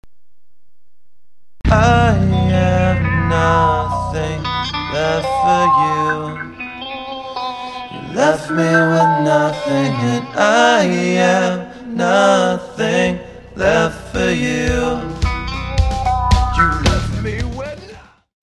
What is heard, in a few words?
Small Stone; Freedom Amp